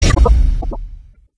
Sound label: spawn; slender; laalaa